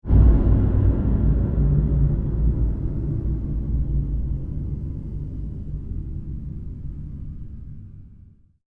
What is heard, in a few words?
pulse fade, Sound effects created by manipulating other sounds; Rises and Dives